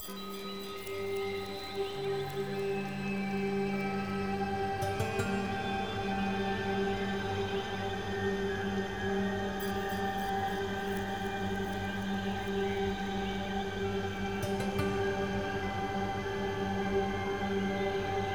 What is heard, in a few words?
Music Forest